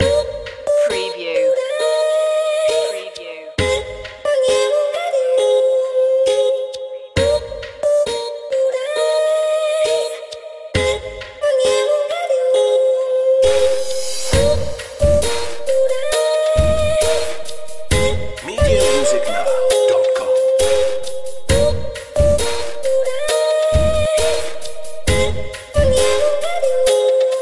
talking desire 67bpm

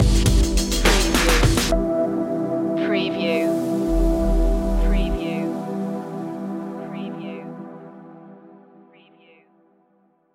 night games stinger

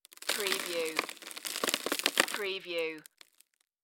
Debris Dirt Dust

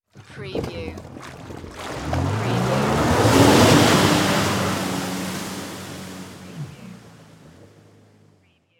Jet Ski Start Up Ride; Sports & Games / Other / Misc Sports Sounds; Sound Effects Bible

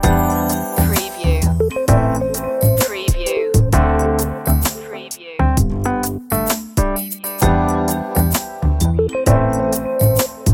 sand and sun loop

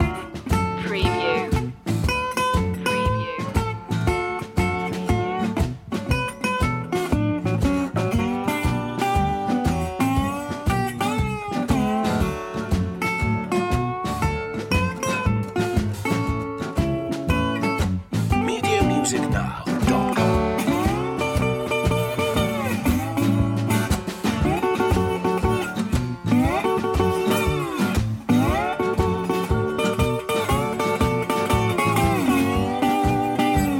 barbed wire blues loop

